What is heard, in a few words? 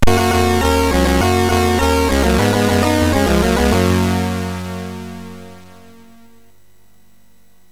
Super Cup Football, MOS6581R3; hvsc